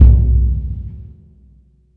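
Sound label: Burundi drum, Heart of Africa; Spectrasonics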